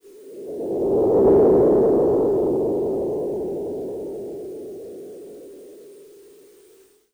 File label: Korg DS 8 Synth Wind